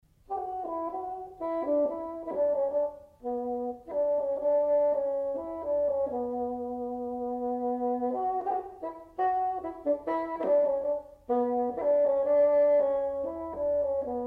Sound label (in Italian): fagotto suono; strumenti